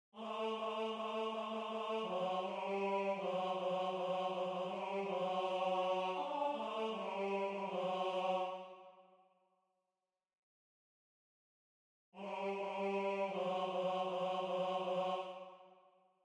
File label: Tenor